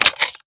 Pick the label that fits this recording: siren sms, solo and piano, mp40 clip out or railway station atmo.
mp40 clip out